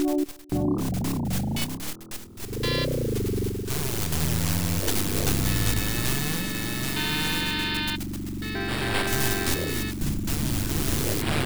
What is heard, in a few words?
Noise; De-Ja II; PC-98